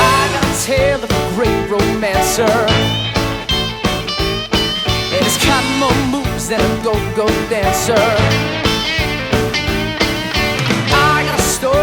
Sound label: dance music